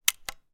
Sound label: gun marlin 336; still north media